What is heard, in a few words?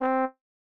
Trumpet; Super Mario Bros. Remastered